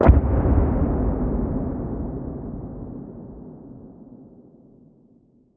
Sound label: combat atmosphere; World of Tanks